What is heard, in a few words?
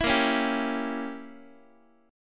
Bb Diminished 7th, Guitar; x,x,7,8,5,6; strummed; 4A,4E,5A,5D,5G#,6C#; chord shape